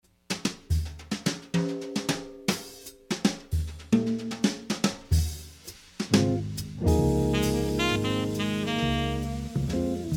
Jazz Quartet

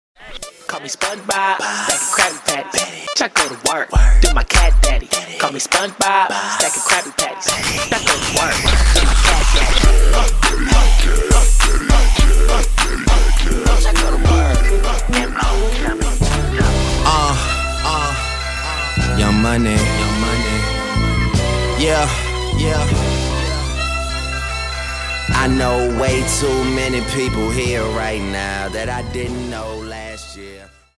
Hip Hop Rap Mix; Mobile DJ

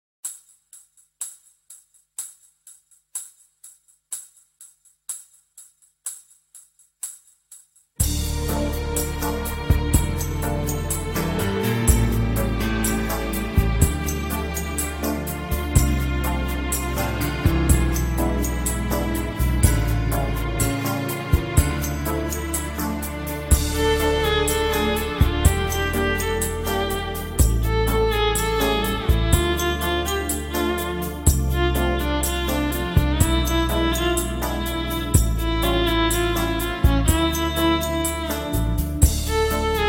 Violin; Eclectic Violin Music

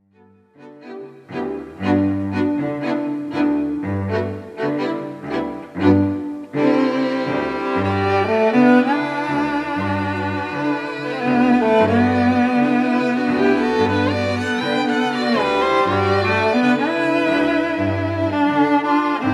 String Quartet

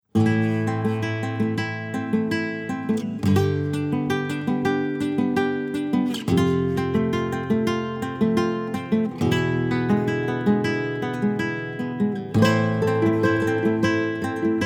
Tres Guitaras; Latin; Gypsies

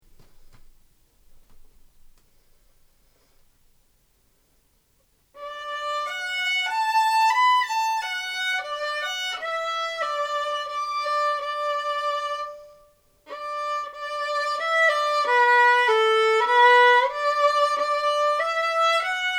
violin solo; Be Thou My Vision

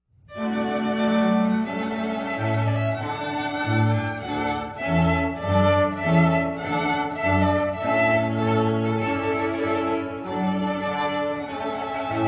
Trumpet Voluntary, String Quartet; Classical; Heritage String Quartet

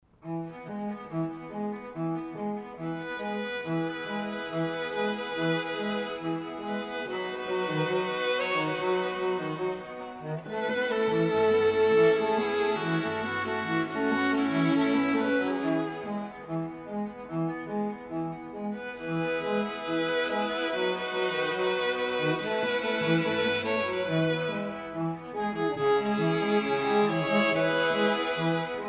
string quartet

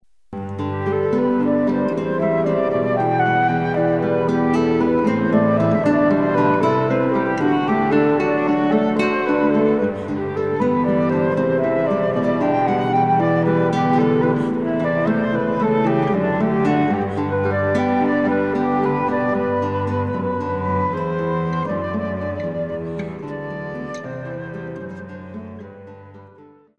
Guitar Flute And Cello; Jesu Joy of Mans Desiring Bach

